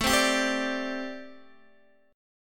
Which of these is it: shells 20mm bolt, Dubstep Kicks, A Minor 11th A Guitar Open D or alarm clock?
A Minor 11th A Guitar Open D